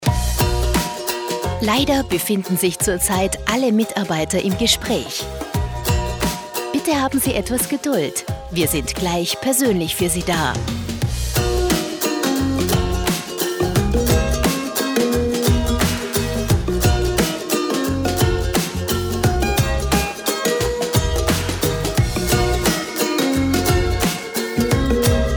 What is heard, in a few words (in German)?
Warteschleife